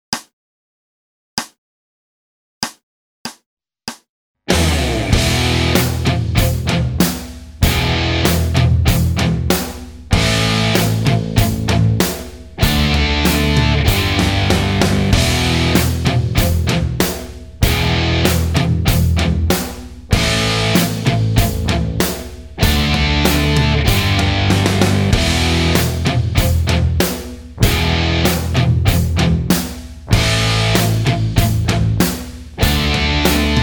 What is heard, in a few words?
fight to the end backing track, guitar